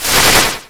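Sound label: Battle Skill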